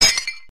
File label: Glass Rekt